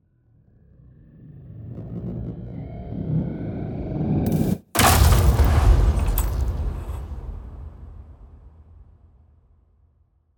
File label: Impacts designed; soundbits